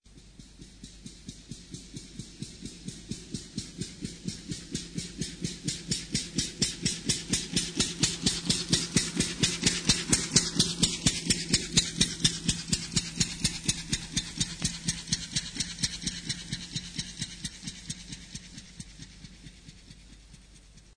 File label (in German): Patentwagen Sound Oldtimer